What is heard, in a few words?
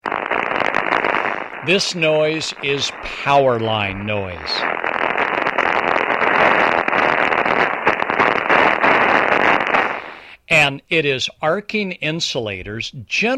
powerline, noise